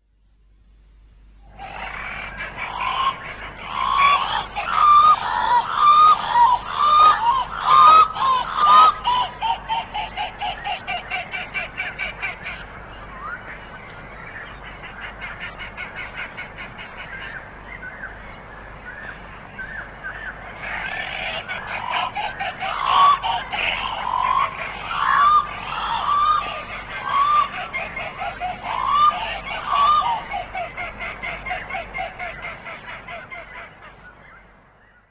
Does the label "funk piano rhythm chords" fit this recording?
No